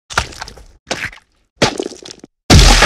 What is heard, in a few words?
Blood Gore Carnage and Tearing Flesh